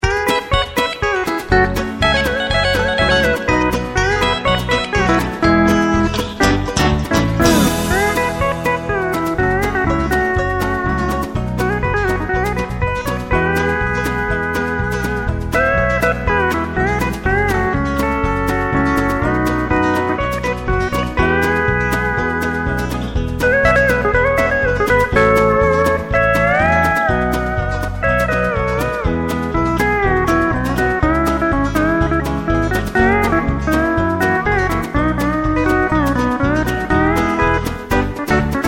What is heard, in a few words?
Blues, pedal steel